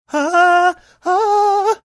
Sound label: singing haaah haaah